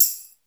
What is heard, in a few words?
Tambourine, Roland JV 1080; Drums